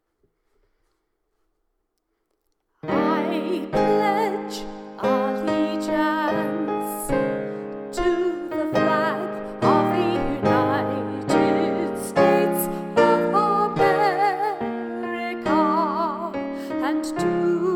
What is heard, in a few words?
march vocal